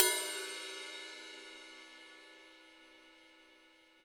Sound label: HiHat & Crash, Roland TD-20; HiHats